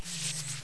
Scorpion Breathe

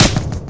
Ball bounce; Nickelodeon Super Brawl Universe; Android

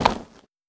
Barbarian Cloth

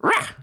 Little Attack

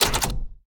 Item Machine Scroll; Santuario

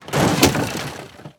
Water Splasher; rabbids multiverse; iOS, macOS, Mobile

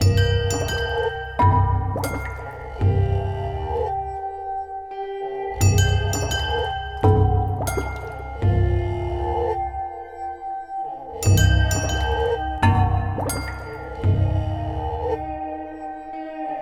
turtle ferry; curious expedition 2 macos ps4 switch windows xbox one xbox series xs gamerip 2021

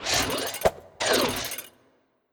Flying Dagger Launch

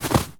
Footstep Snow Run

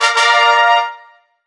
Sfx Bowling

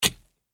Dirt Impact With Pick Axe

